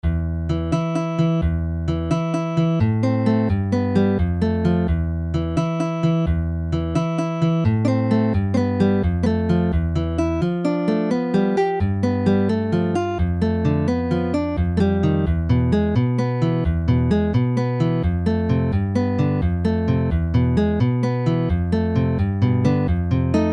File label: Andalusian Dance, guitare